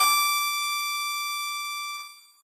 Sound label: piano; pianojs